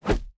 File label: javelin throwers attack